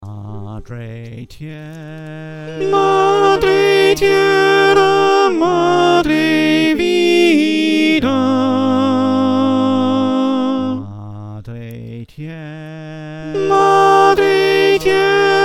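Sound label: alto, choir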